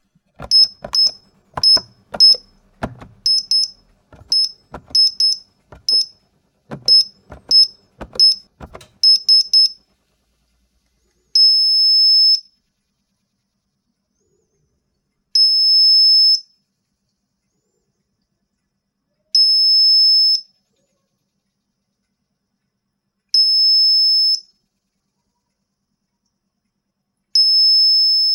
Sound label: Alarm System Arming